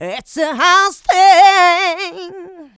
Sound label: Female Vocals; Houseworx